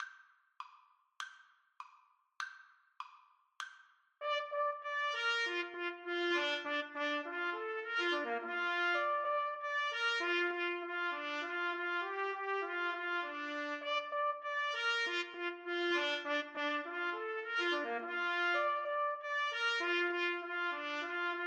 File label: duos allegro, Trumpet Duet